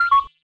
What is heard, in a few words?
Charge